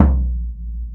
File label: Bombo; Planet Earth; Digital Sound Factory Planet Earth